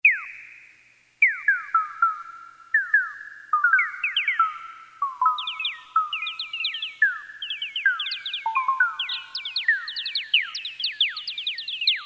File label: Bird Tweet; Roland MT-32